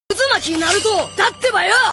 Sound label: message tone; uzumaki naruto